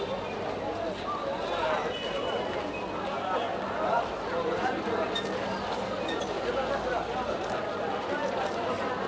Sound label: Egypt crowd